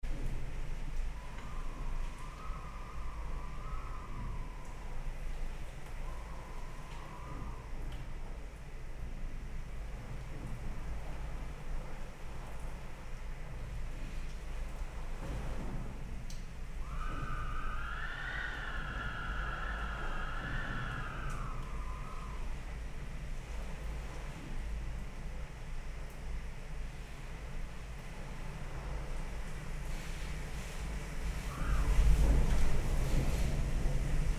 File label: 4th floor back inside